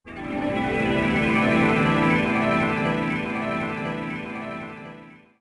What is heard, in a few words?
Logo; mind. body. soul. blend it 2010 nds gamerip; Crush